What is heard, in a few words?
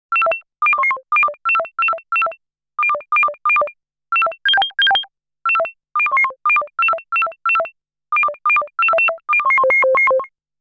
Mary Had A Little Lamb (Simple Version)